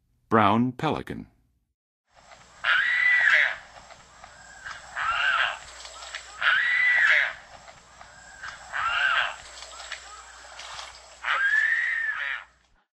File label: Brown Pelican, Birds of North America; Western USA; Western USA Songbirds